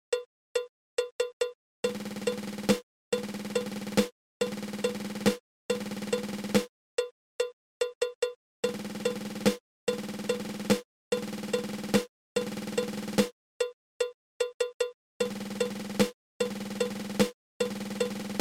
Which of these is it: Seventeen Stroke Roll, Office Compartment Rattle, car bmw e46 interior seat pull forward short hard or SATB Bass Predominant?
Seventeen Stroke Roll